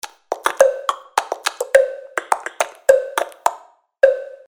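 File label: ringtone for SMS messages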